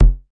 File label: kick, drums single hits; iElectribe Kicks